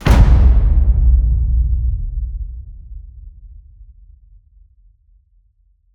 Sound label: HIT Epic Drums, Cinematic Hits Designed